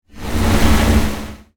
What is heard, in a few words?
Sci-fi Transition